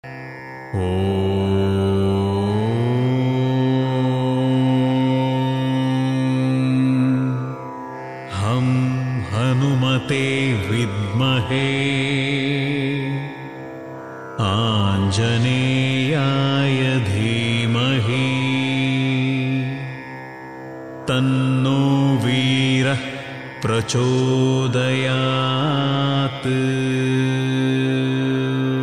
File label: hanuman gayatri; hanuman (vira anjaneya) gayatri